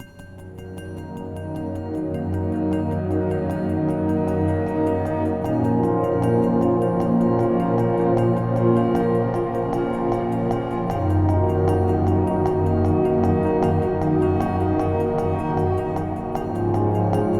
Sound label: Hubs Amb Cue C; Syndicate New Loka; Tenno Hubs Loka